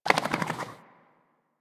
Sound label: Puppy Foley Idle Ear Flap; Warframe; Kubrow